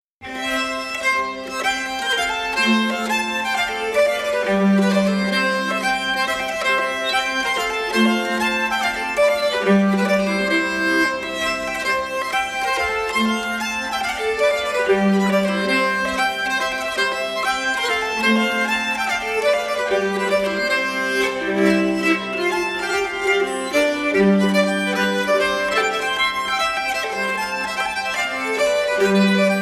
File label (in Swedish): Polska i C-dur; efter August Bolin